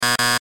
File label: Game Show Buzzer Double; Miscellaneous Electronic; Sports; Miscellaneous Electronic Sound Effects; Blastwave